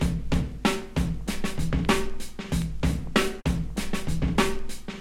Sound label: 96 bpm modern breakbeat a key